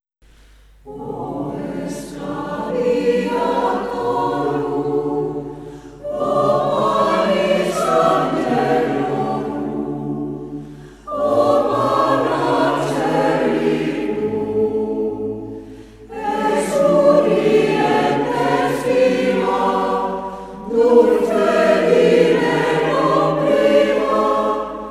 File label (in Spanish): coro